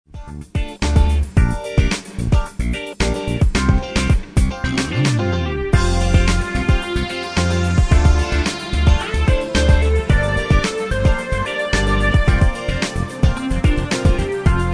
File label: FUNK; Suite Coll. Vol.9